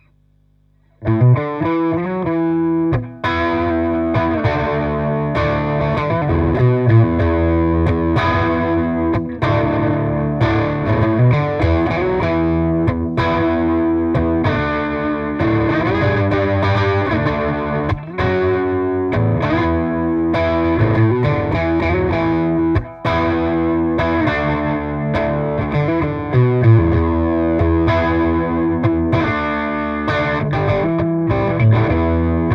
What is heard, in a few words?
AC30 Overdrive